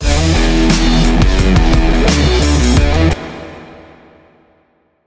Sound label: guitar; axel nosferatu